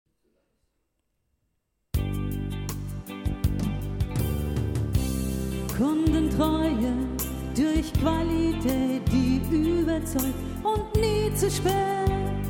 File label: Jingle